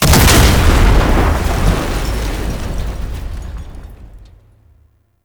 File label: gatling gun explosion; Grineer Prime; Warframe; Tau